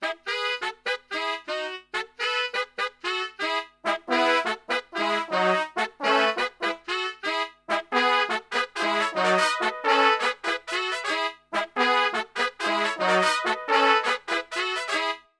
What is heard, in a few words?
BRASIL, Brass Super Section, BRASIL LICK; Best Service